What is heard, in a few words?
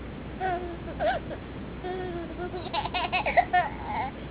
laugh